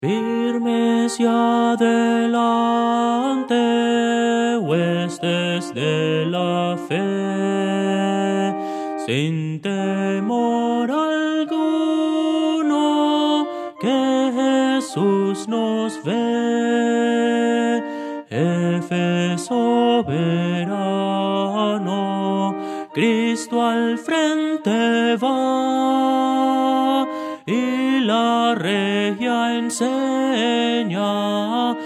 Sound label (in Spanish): melodia; Voces para coro